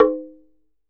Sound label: TUNG HI, African Perc; E-MU Producer Series Vol. 7 – Old World Instruments